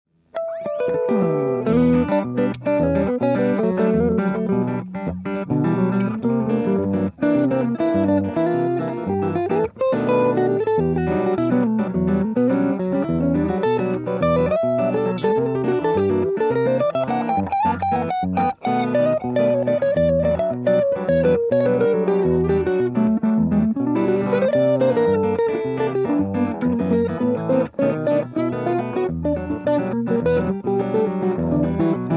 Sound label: samba; pohjois-euroopan huonoin samba